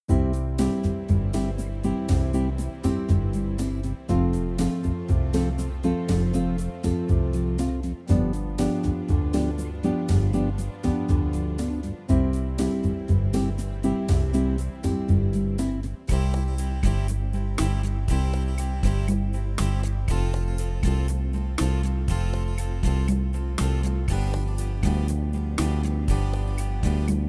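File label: melody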